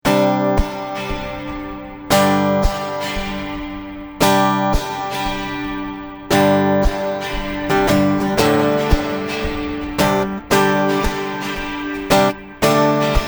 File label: tremolo